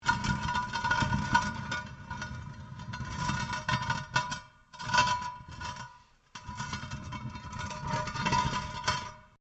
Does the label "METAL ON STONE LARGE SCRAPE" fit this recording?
Yes